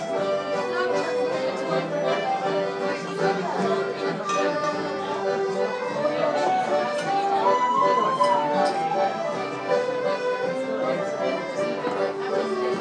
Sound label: trad session in pub; guerin's pub